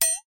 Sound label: thin metal hit, thin metal bar hit